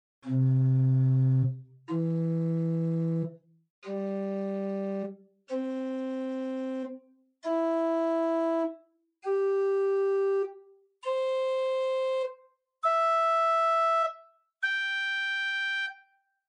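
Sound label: Pedal Oktave 4 arp, organ stops; Grace Lutheran, Champaign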